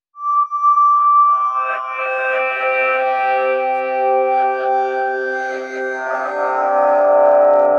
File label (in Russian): Reverse, реверс